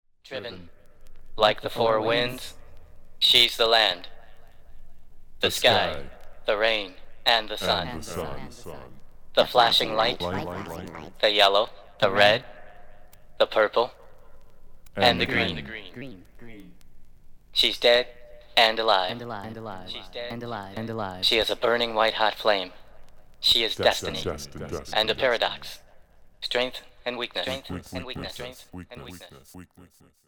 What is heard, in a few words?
vox